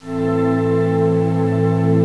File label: mystery, miscellaneous sounds; Sound Effects for Multimedia - Volume 1